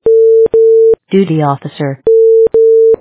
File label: duty officer alert